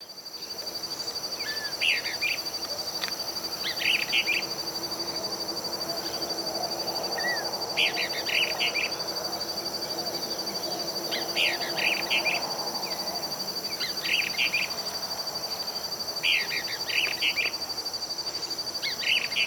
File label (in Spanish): Fiofío Grande Voz, aves